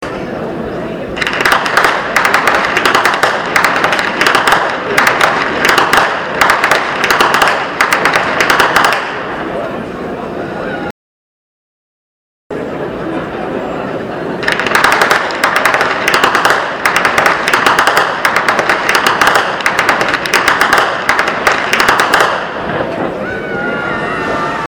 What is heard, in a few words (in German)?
schnalzen, jugend